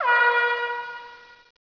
HORN; SOMC Family Forum

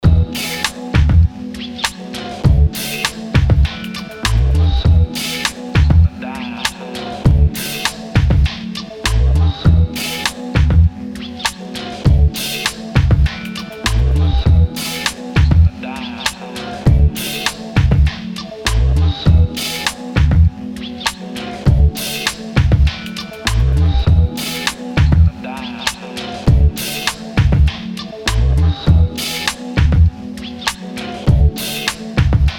Abstract HipHop; E-Lab; e-LAB MP3 Demos

